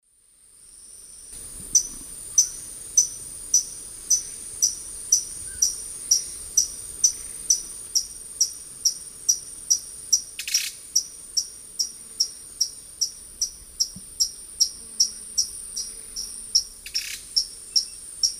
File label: Todirostrum chrysocrotaphum, song; S. America; Eastern Lowlands